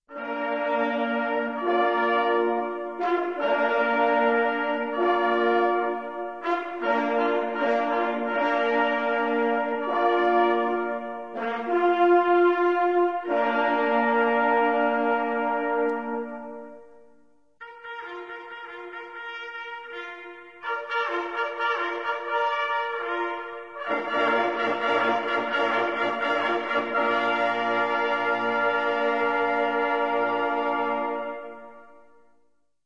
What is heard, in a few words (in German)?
jagd vorbei halali, signale